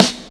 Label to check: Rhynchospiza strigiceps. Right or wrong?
Wrong